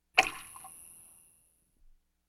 pfeil in die luft schießen; Sport

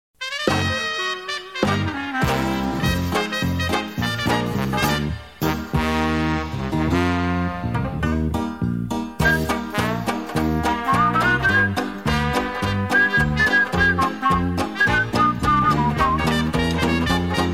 filmmusik; Geraeusche; dick und doof

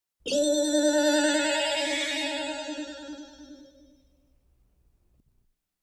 energiestrahlen; Science Fiction

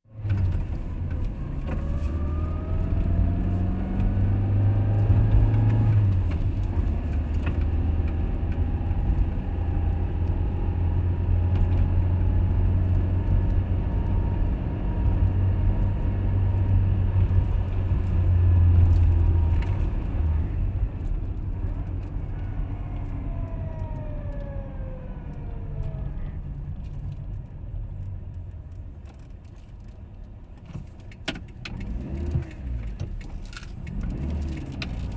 fahren, langsamer werden, Auto; Auto-Zweiraeder

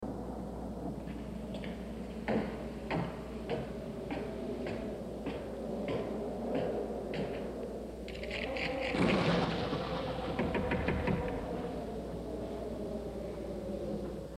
Schritte; Horrorgeraeusche

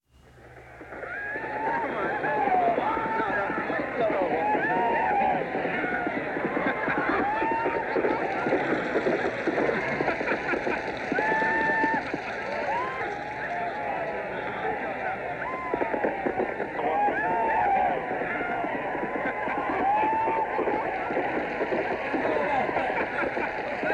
rodeo; sport

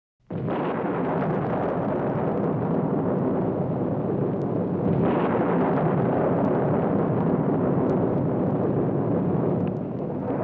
Unwetter mit Regen; Horrorgeraeusche

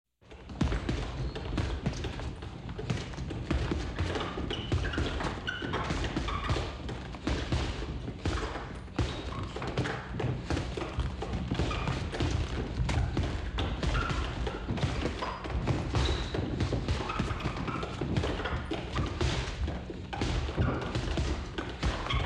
schnell geschlagener punchingball; Sport

